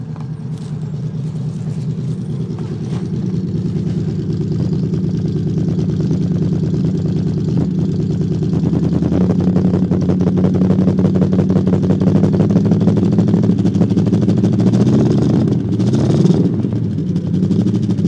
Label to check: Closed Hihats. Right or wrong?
Wrong